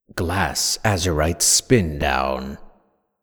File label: Glass Spindown; Azurite